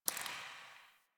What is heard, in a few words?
Derelict Interior Water Drip